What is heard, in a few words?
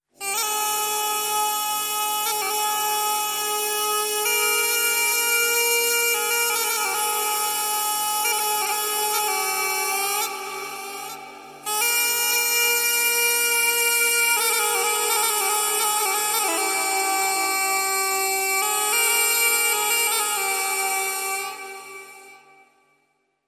Isole, Launeddas Solo